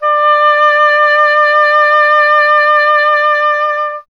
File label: WND OBOE, Solo Instruments